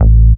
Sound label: DR. LOOP, BASS; X-Static Goldmine 3